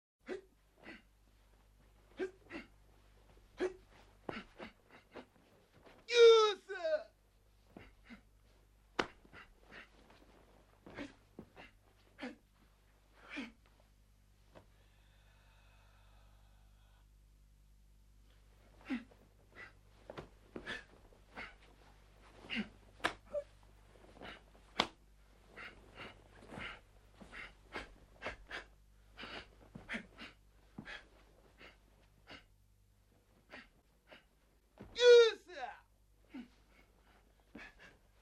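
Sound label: kata, official greetings before the fight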